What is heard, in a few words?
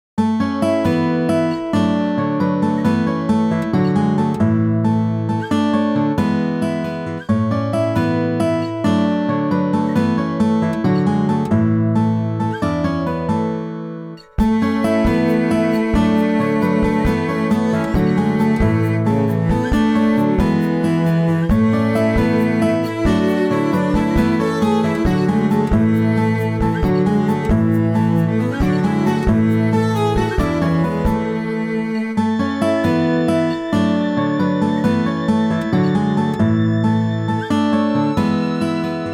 Gentle Breeze; Princess Waltz